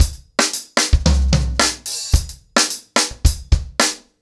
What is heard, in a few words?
110BPM, ACOUSTIC DRUMS